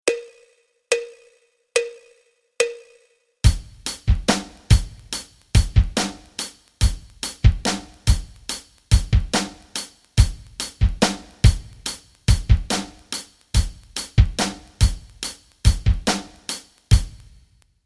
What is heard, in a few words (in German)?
rock rhythmen